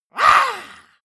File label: monster growl attack high; schreie